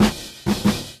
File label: stop; Geschicklichkeit; AquaBubble2